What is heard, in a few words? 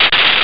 zap, instruments; sonix; Rise Of The Dragon; chipmusic